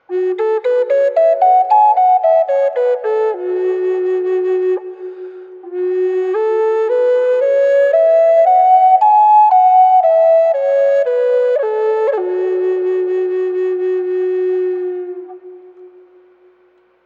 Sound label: western red cedar flute; standard flutes